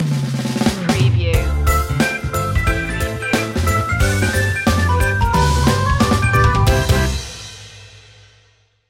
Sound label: walk happy stinger